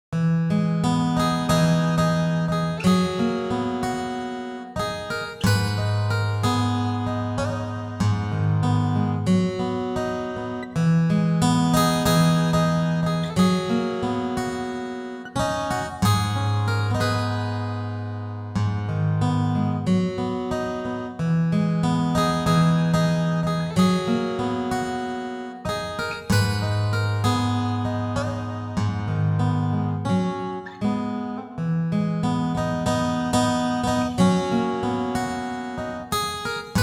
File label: Guitar; Passing Works